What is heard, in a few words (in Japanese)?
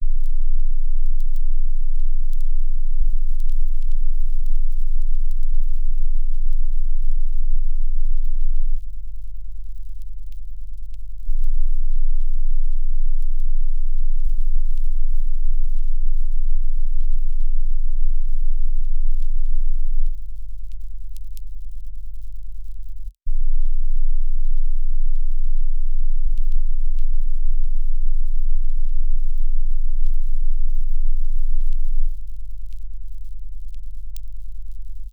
性的快楽による気絶 20Hzノイズ